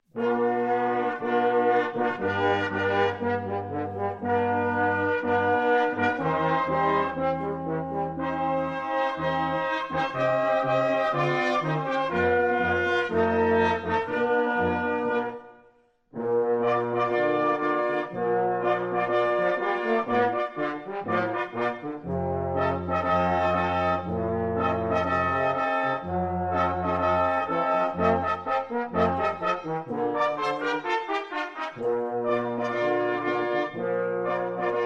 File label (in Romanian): Fanfara